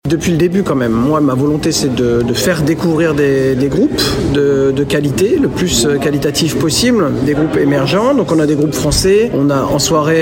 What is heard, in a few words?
brass; Surgères Brass festival